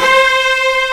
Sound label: STR ATT